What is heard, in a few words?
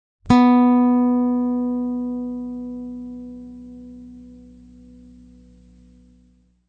tune